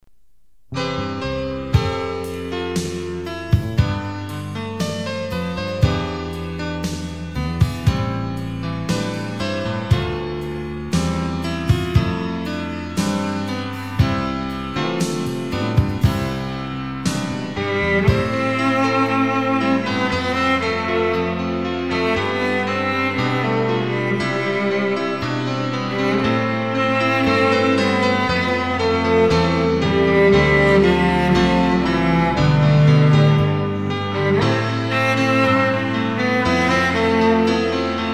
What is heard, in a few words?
Cello